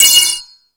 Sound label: Hip Hop, Drum Machines E-MU Pro-Cussion